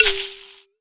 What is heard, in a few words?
Processed Ethnic Percussion